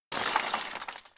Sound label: marble break; Apotheorasis